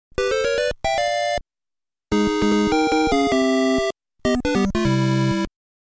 bonus start - success - failure

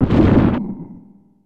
Ka-Floom; Super Smash Bros; Master Hand

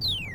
Bowser Fall

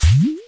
Podoboo Hit With Ice Ball

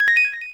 Reserved Item

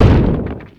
Thowmp Big; Wii

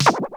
Fire Ball Hit Giant Goomba; New Super Mario Bros. Wii

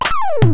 bomb hit; Gnat Attack; Super Mario Paint

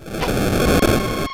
boss jet; Gnat Attack; Super Mario Paint

